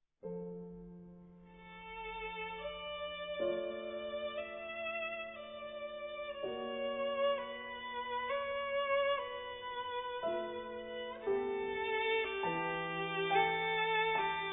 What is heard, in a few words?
violine